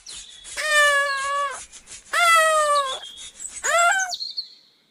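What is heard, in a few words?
lenivec malysh, baby sloth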